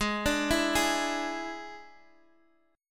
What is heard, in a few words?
D Suspended 2nd Flat 5th Ab, guitar